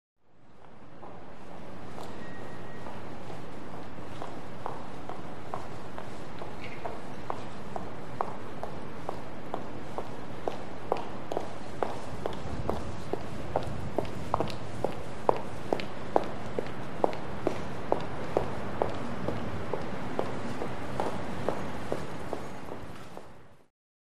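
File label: Footsteps In By Medium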